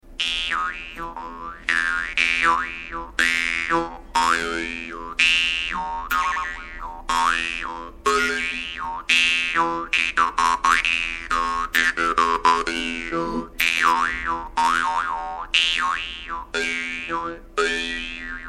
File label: yakut khomus; yakut khomus prokopiev small